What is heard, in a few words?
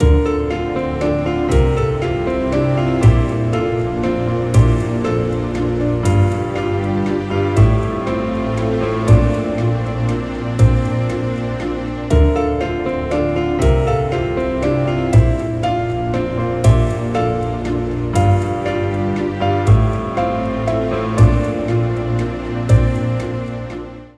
bouncing shadows